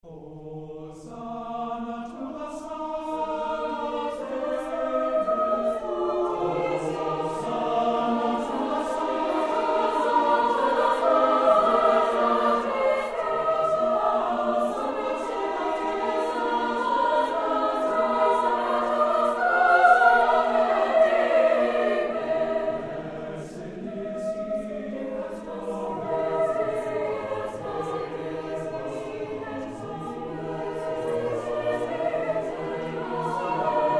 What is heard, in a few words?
Chorale Hosanna